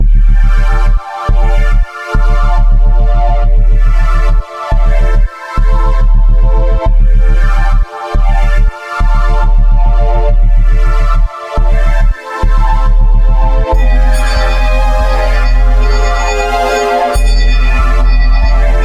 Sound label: wub fun without drum; lolicore; J.W.Records